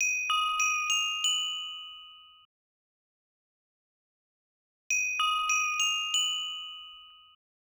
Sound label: Bells, Hip Hop Exotica, Apple Loops; Big Fish Audio